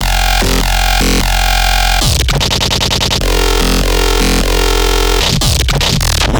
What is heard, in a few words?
Bass Shot F 150 Drill, Dubstep Basses; Loops; GhostHack; Advent Calendar 2021